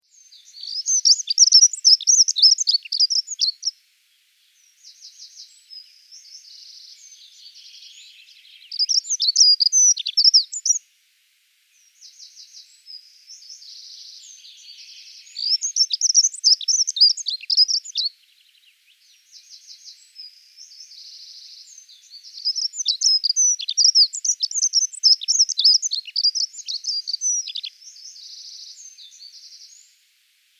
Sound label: Prunella modularis